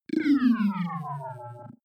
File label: wobble drop long